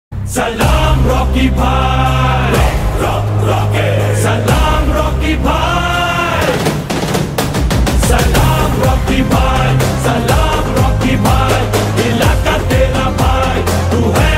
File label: Telugu Movie Ringtone; KGF